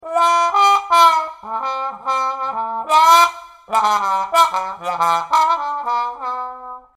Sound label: Trombone